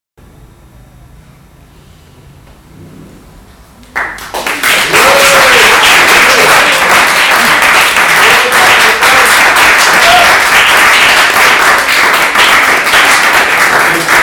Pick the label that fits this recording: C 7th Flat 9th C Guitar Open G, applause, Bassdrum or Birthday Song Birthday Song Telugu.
applause